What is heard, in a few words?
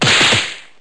blows